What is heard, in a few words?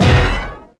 Stab Vinyl Bend; One Shots; Ableton Live 12 Lite; Core Library